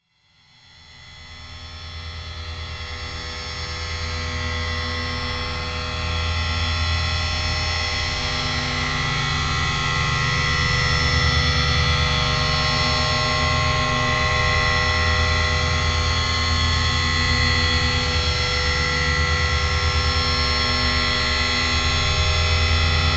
Alien Spacecraft Electrical Drone; Drones; Glitchedtones

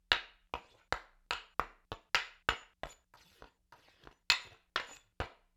Axe Spear Blade on haft, Weapon on Weapon; StillNorthMedia

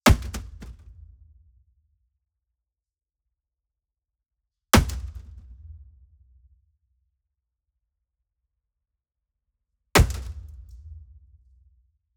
Impacts SIMPLE; SoundBits

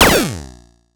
8bit hit; Retro Games; SoundHolder

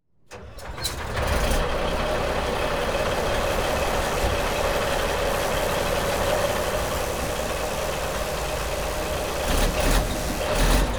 Quarrying, Dumper Tricks, 3 Start; BBC Sound Effects Library; Explosions Guns Alarms

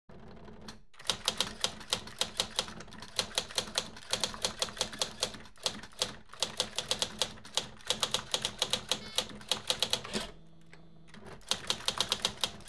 Computers, Telex AND Typewrite; BBC Sound Effects Library

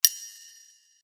Sword Sound; FXHome; Fighting Sounds

